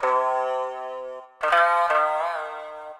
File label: ETHNO 160 C